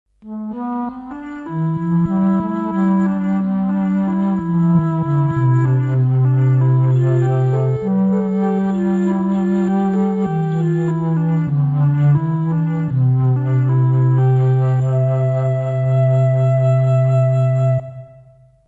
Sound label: bass flute